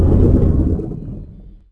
Cap Attack

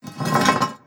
Turrets Metal; Legend of Keepers (gamerip) (2019)

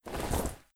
Clothes Drop; Legend of Keepers

